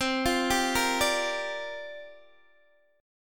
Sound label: C 7th Suspended 2nd Suspended 4th C down Guitar DADGAD